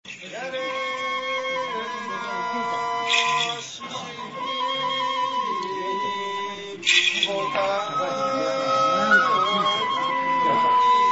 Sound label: banjo